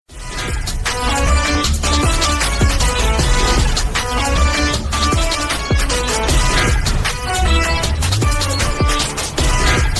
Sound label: bgm ringtone tamil song ringtone; thalapathy vijay